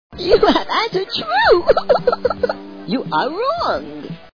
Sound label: laugh cry